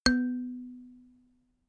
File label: notification kalimba single low